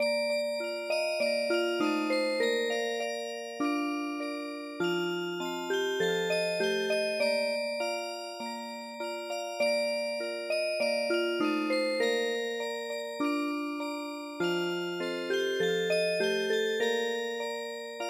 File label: Music Box, midi